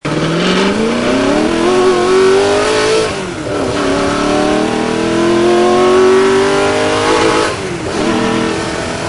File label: Car sounds Ford GT40; Le Mans; Le Mans Les Hunaudières